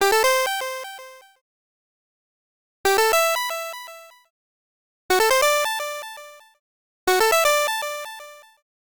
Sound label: Arcade Click Positive